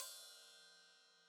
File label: Hat Open, Hi-Hat; Deeply Sampled Drums